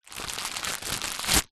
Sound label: packet empty frozen veg un scrunch; foley